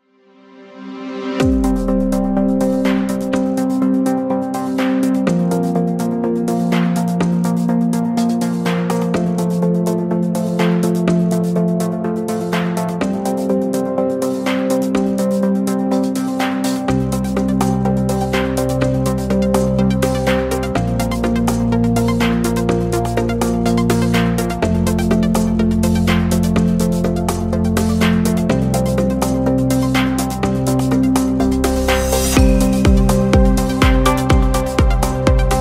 background stock music a new corporate royalty free instrumental